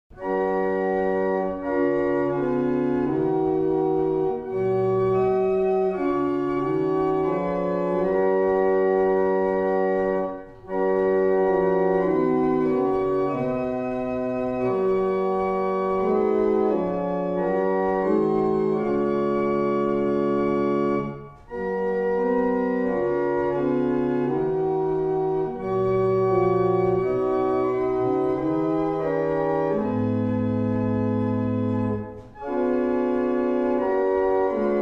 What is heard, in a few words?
Eventide, barrel organs; Woodrising